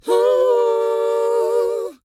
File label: WHOA, R&B & Dance vocal; ILIO; Vocal Planet